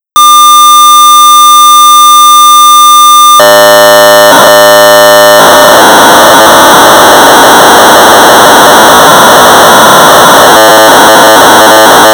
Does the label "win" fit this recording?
No